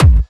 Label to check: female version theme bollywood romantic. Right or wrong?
Wrong